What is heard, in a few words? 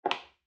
footfall heel